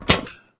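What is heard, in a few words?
Grunt Movement Footstep Walk Metal Grate; Titanfall 2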